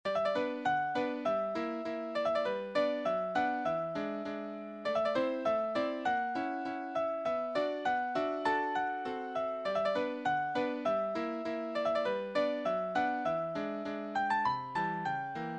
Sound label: piano